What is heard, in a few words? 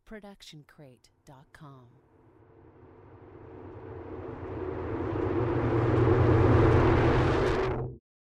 distortion wave reverse